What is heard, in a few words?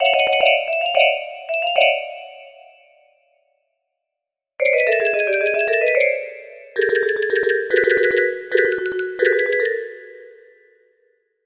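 music box melody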